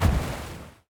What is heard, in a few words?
ship engines; World Of Warships